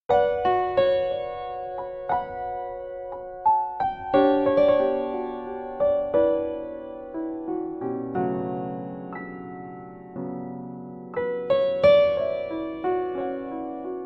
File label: bit rainy